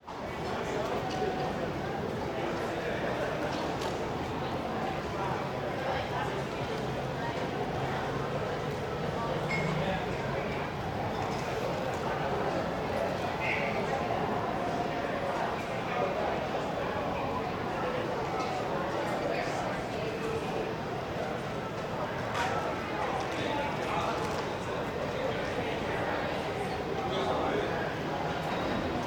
Crowd Exterior Large Size, Restaurant, Voices, Footsteps, Distant Intercom; Ambisonic; 5.1 (LCRLsRsLf); 2496SoundEffects; Ambisonic Crowds